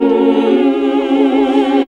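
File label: classical singing; Sound & Vision - Gigapack II CD 1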